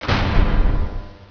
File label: Die